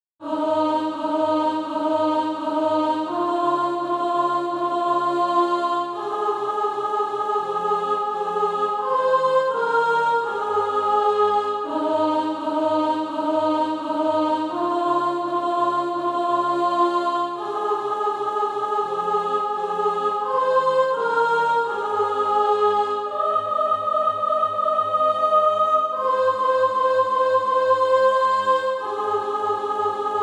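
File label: soprano, SATB Practise Music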